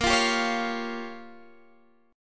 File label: A sharp Minor 6th, down, Guitar, Standard; strummed; JGuitar